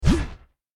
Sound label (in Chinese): 删除